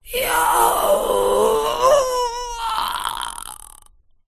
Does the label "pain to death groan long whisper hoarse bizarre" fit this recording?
Yes